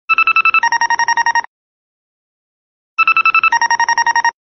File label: businessring